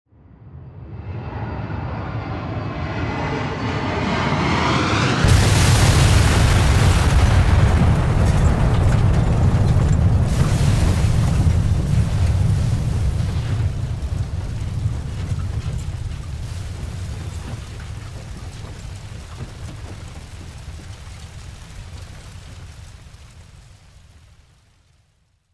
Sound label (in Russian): Крушение падение пассажирского самолета